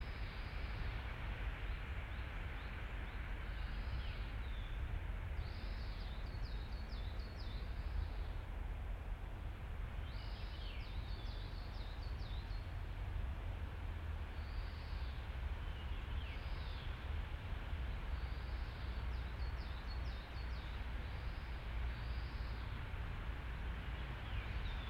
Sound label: yamanakako sound